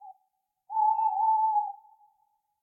Tawny Owl; SyrinScape

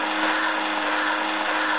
steam roll; The Elder Scrolls III - Morrowind

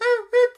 bird

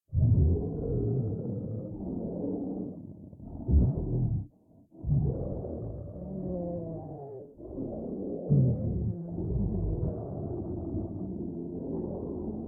Zombies behind glas; SyrinScape; Temple of Urgathoa

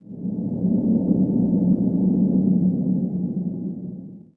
wind LOW; The Elder Scrolls III - Morrowind; Morrowind GOTY GOG

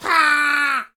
Goblin Scream; SyrinScape

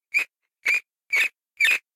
Rat Squeak; SyrinScape

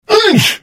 Hit; The Elder Scrolls III - Morrowind

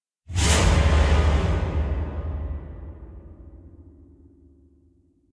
piano notes; SyrinScape; Madness in the library

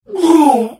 Hit; The Elder Scrolls III - Morrowind

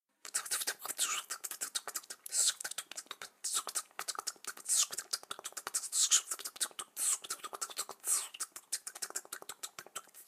Scittering insects; SyrinScape; Syrinscape unplugged; Jungleplanet dinolaser battle

